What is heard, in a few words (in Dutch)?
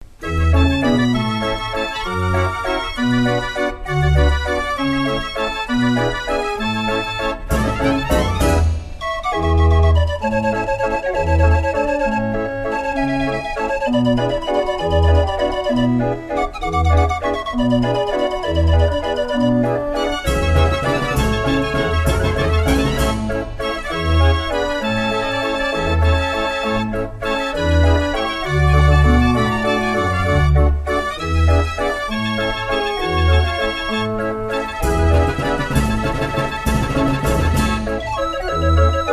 draaiorgel; het blauwfrontje